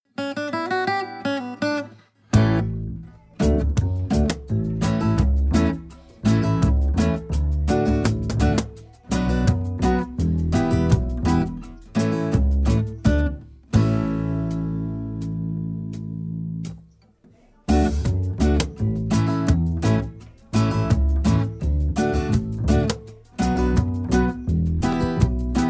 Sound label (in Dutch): instrumentaal